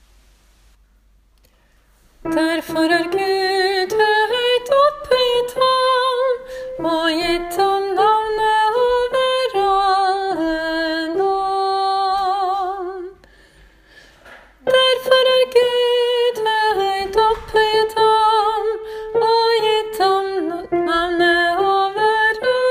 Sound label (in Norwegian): kristushymnen tenor bass; åfjord